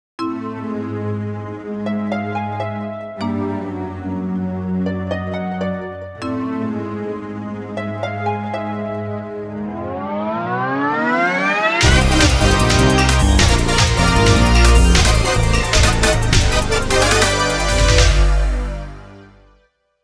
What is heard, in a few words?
Stage Start; Mega Man X3; Saturn; Neon Tiger